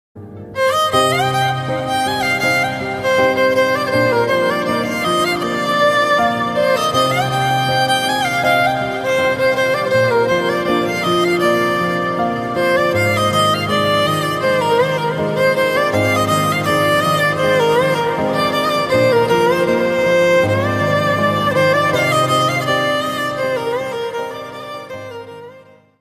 instrumental ringtone violin version

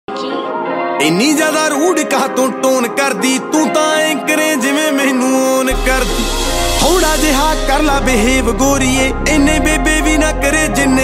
Punjabi song ringtone

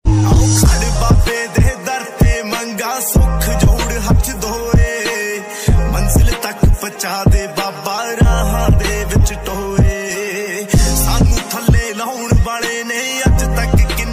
new punjabi song ringtone best punjabi ringtone

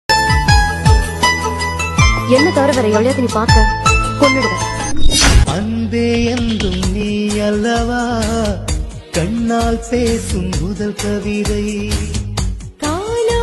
love bgm love ringtone tamil evergreen ringtone; vijay; sneha

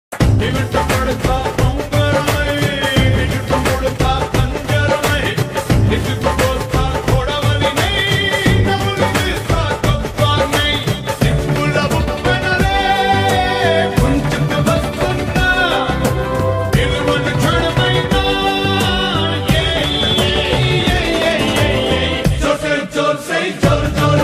movie bgm ringtone telugu; magadheera; telugu movie songs

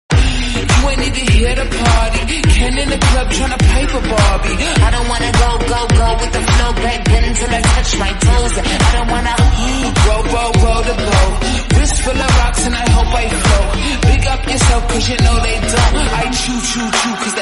slowed reverb ringtone music beats

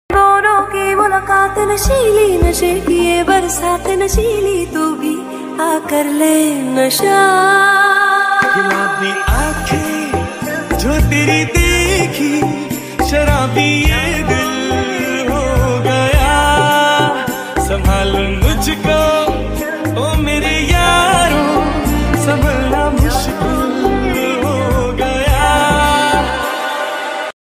8d sound ringtone bansuri ringtone

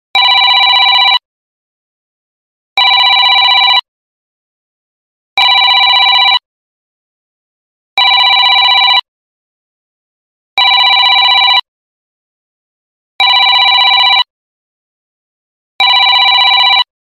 80s phone ringtone

